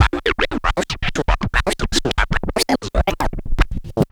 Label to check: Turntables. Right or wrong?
Right